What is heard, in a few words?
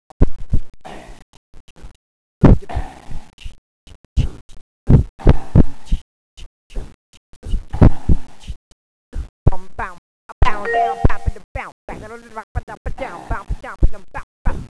beats